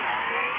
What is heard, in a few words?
Mechanical slider; puzzles